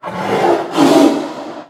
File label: tiger snarl